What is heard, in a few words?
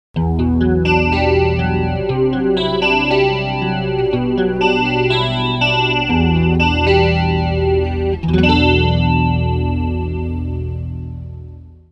Flanger